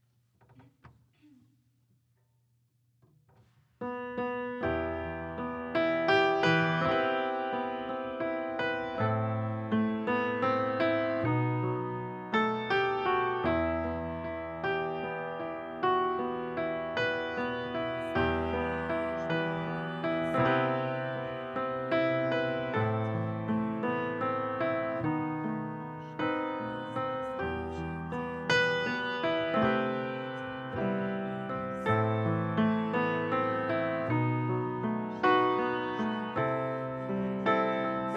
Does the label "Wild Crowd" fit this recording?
No